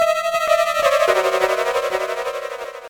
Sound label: Music Suspense accent; Nancy Drew Last Train to Blue Moon Canyon